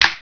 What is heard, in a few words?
move attack jaw snap; ourano